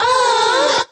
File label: female orgasm